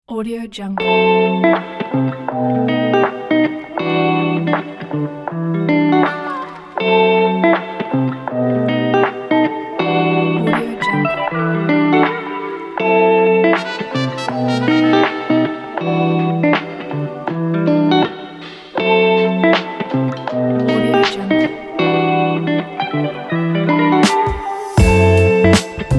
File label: rb inspiring background